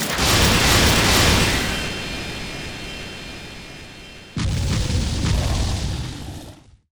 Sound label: Three Missiles